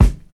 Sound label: smooth bottom end kick drum G key